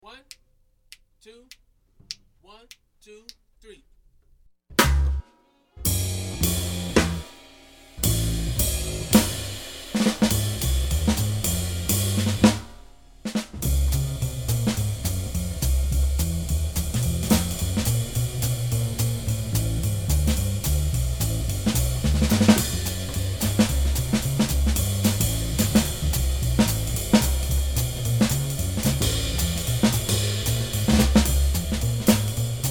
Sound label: bass drums only, jazz